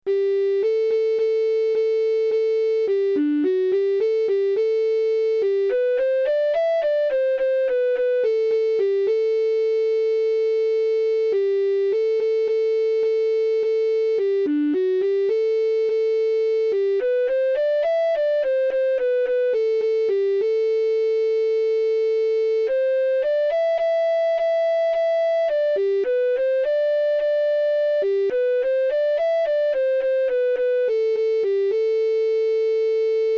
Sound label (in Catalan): melodia